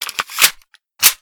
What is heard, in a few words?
reload